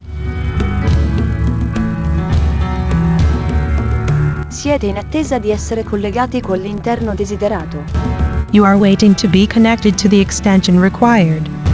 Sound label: Attesa Ambient Ita Eng